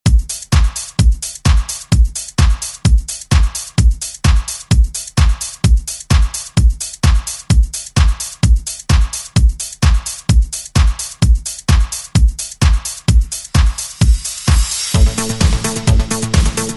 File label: 80s ReDrum